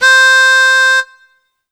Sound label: Alesis S4 Plus Harmonica C5